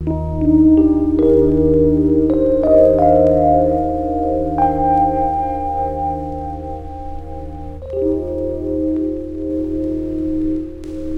Melody, Drum & Bass Melodic Synth

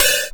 A#, Synclav FM PERCUSSION; Big Fish Audio

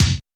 Roland U220 Dry Set

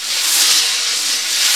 BRUSHES, 12-Bit Drums; E-MU Formula 4000 Series Vol. 1; Hip Hop Nation

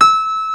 STENWAY; East West; Global Sounds

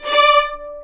D5 VLNS ARCO DB; East West; The Ultimate String Collection

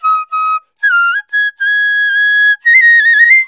INDIA PICC

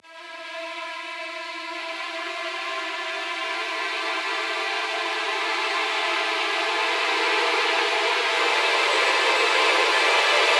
Orchestral Riser; MISC

